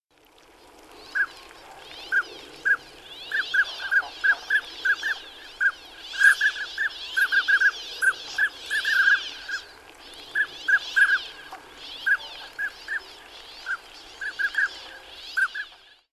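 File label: northern pintail, hyogo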